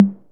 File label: low mid tr 808 electronic tom sample g key; tom drum